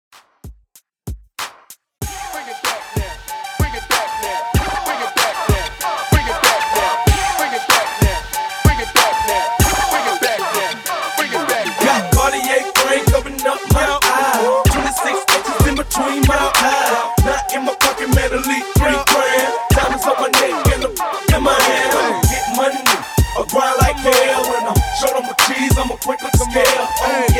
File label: Re Drum Mixshow 95 BPM